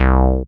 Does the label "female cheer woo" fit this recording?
No